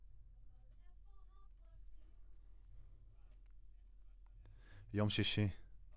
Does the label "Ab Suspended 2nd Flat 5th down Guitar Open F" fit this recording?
No